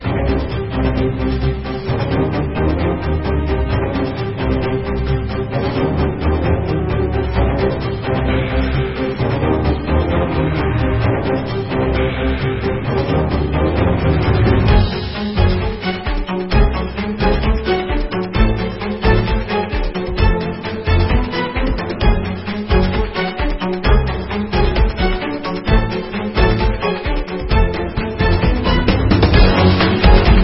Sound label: background battle; heroes saga android